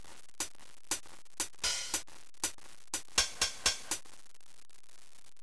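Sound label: hi hat drum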